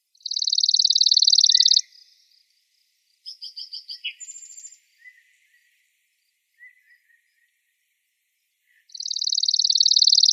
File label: Emberizids; AZFO; deviche